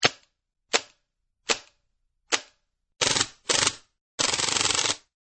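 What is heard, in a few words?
Airsoft MAC11, Toy Gun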